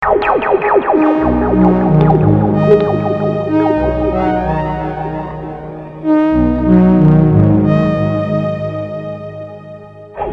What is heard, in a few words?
depressing rock music